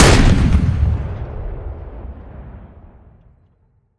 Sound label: Weapons Cannon 73mm Gun; DCS